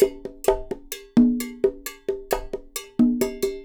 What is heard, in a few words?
Percussion, 132; Sampleheads; NYC Percussionworks; 90 s Sample CDs